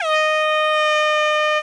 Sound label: AIRHORN